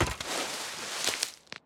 tree leaves; factorio 2.0.55

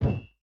metal large inventory pickup; factorio 2.0.55

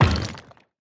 car stone impact; factorio 2.0.55

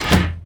cargo wagon open; factorio 2.0.55

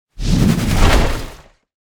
tank debris; factorio 2.0.55

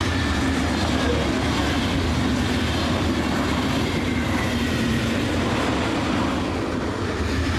train wheels; factorio 2.0.55

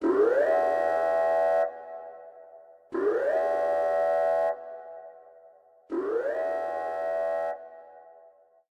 silo alarm short; factorio 2.0.55

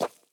dirt, walking; factorio 2.0.55

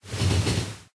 train tie; factorio 2.0.55

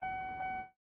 rail signal inventory move; factorio 2.0.55

